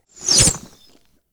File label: Whoosh, Bow Arrow Shoot, Magical, Surreal, Science Fict; Sound Design